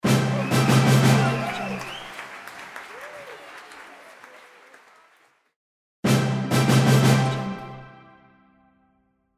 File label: game level up; medusamusiclab